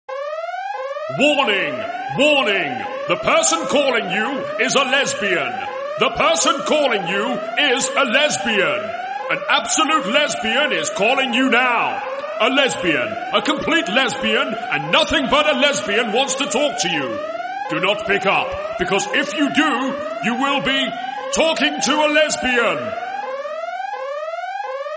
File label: warning bgm english